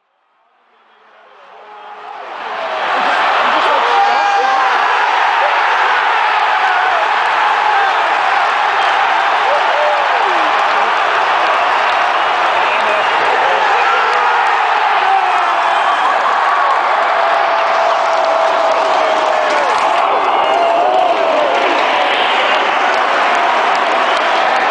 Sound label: millennium stadium roar; wales v ireland 12th march 2011